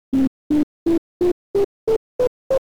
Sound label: diapason scale